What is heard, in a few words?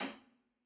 80bpm bop one shot HH Soft; Hayman